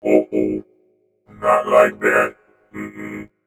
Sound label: UH OH, Vocoder Vox; E-MU Producer Series Vol. 8 – Platinum Phatt